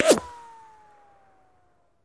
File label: Bullet Ricochet Sin